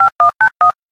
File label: digicode; scifi nodes